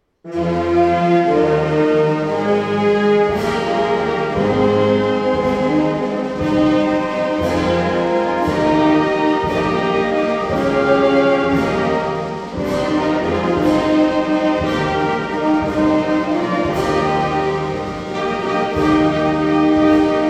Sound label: hymn